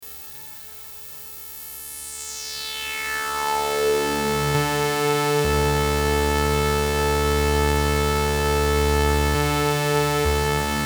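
voltage-controlled filter high-pass frequency sweep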